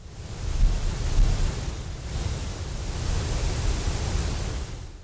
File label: wind full leaf; EnvSound